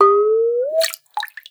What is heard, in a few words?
water; Xsample VOL-9